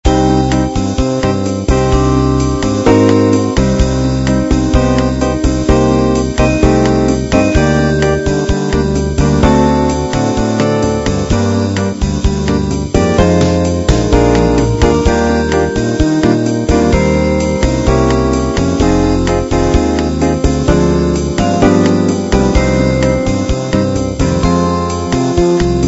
bossa

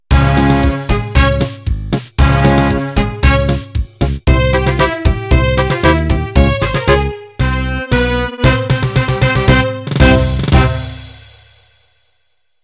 Pasodoble End

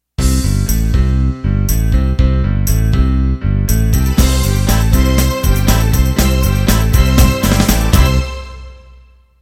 Motown Intro

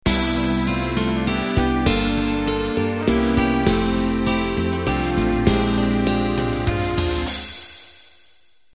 Waltz Intro; Waltz 3y (pop); Norton Music

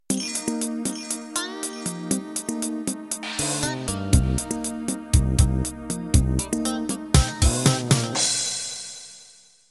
Hip Hop Soul intro

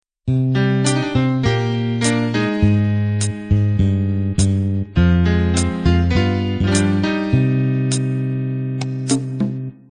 natural beat intro

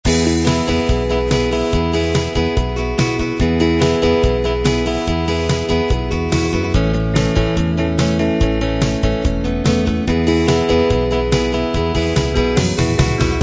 lite rock

